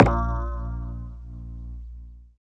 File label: SYN, DANCE SYNTH